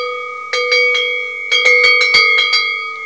cowbell; M25 on the way to the Wingate & Finchley away match

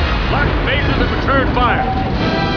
lock fire

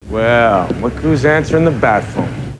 batphone; Krycek getting an important phone call

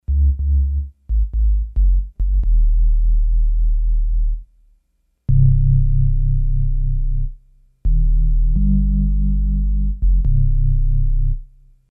deep bass

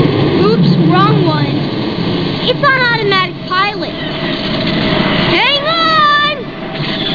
SWoops; Anakin: Oops, wrong one. It's on automatic pilot. Hang on!

